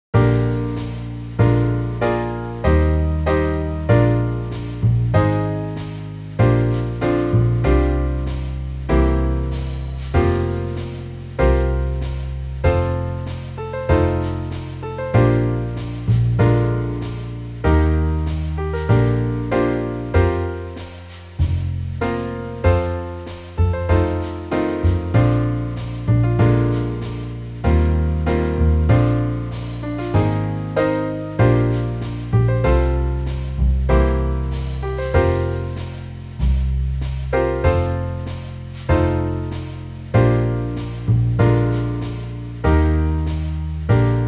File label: Bb Slurs